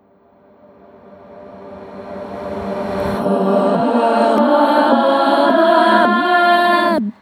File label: Riser Vocal A